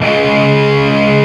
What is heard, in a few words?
BAR C, HEAVY METAL; Greytsounds